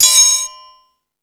Thundadome, E-MU Pro-Cussion; drum machine

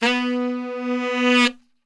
B 2 SAX, Brass Super Section; Best Service

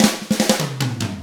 ROCK 6/8, Drums; ILIO; Double Platinum Drums 1

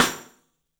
MARFSN, 3D Drums, X STICK; 3D Sound

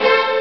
D50 arco; ST-XX Amiga Soundtracker Sample Packs

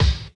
Dance Mega Drums, KIT D+B; Best Service

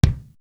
Kick, Drum One Shots; AMG - Coldcut's Kleptomania VOL-1

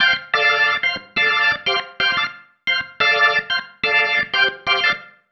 Pattern, Organ

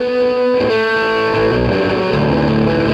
Krush, Electric Guitar; Sonic Foundry (Sony Creative Software) - Friendly Fire

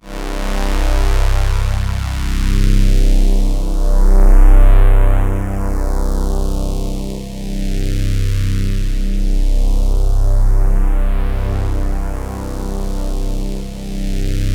C1, Synth Line; 90 s Sample CDs; NiceBeats; SynthLine VOL-1

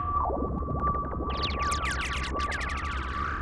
synth FX, Cmin; Hardcore Breakbeat; Big Fish Audio - Hardcore Breakbeat Action; Hardcore Breakbeat Action CD1; 09 Get Down

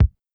SQTM, Sequential Tom

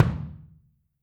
Kick, Drums Percussion; Kick 1

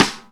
Rim; Sonic Foundry (Sony Creative Software); Loops & Samples Drum Tools; Laid Back

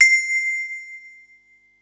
mallets

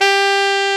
SAX A. MF G, Alto Short; Roland LCDP07 Super Sax

